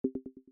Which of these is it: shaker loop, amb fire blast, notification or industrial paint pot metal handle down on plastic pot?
notification